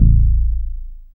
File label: Bass SH-101